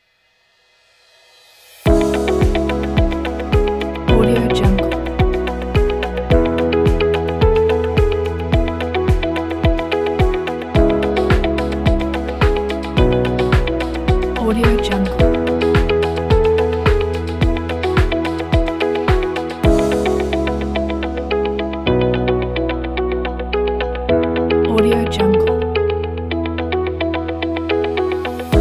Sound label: motivate